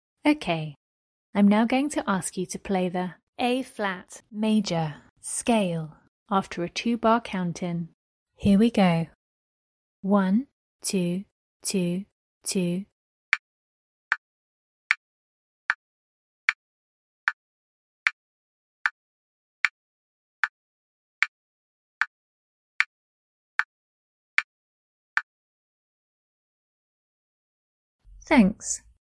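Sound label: A flat major scale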